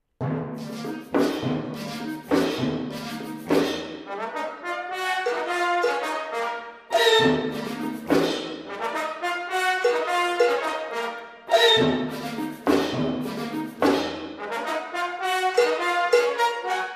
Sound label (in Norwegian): hornmusikk divertimento